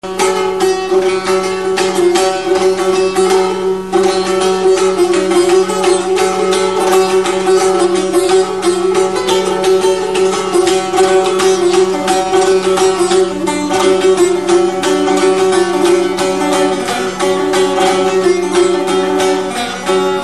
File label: shirv tambur